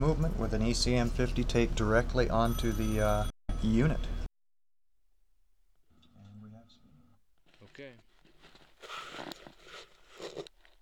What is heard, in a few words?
African Rain Stick Pou; Universal SFX